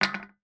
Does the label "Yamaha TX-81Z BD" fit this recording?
No